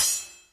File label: sword Clash